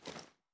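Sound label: foley ads down; weapons; gmod; viper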